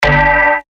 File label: Bass Shots G; Slinger; revealed bass shots vol 3